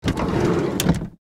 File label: Car MMC insert; Nokia Ringtones; Audio themes